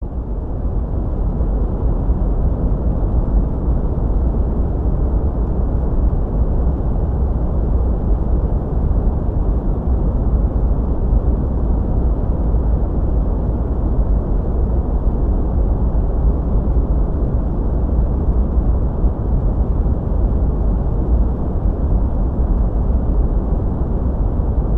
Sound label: cosmic white noise; sleep